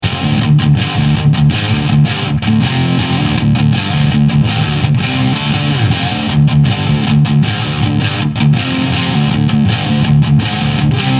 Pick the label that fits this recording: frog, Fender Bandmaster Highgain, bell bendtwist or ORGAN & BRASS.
Fender Bandmaster Highgain